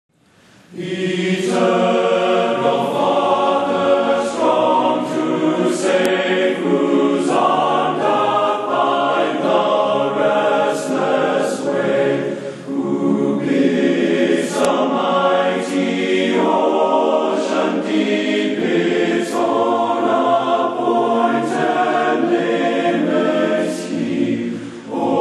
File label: men's chorus